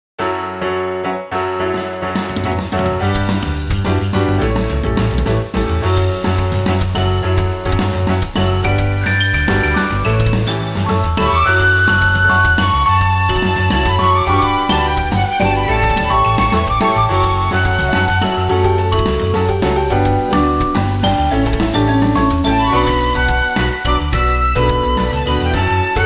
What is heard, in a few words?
Forest RPG BGM